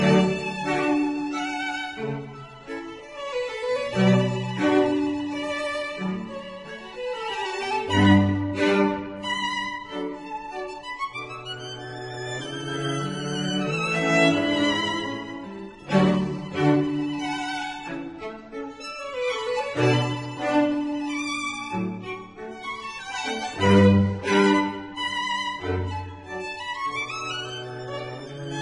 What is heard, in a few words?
string quartet; بتهوون: کوارتت زهی شماره ۱۵; beethoven